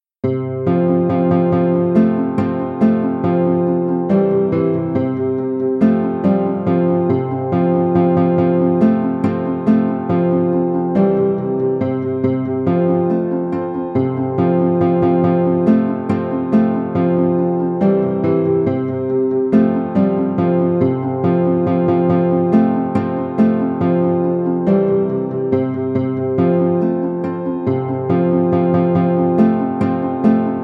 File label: Guitar